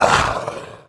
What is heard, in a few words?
hit; SwampWizard; SwampOfDarkness